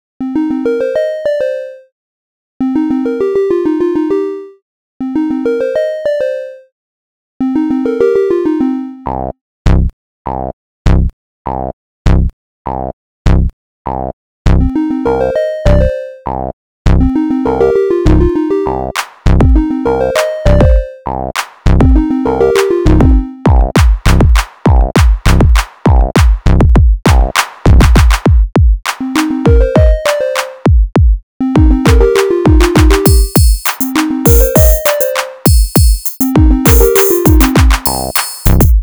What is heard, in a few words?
progressive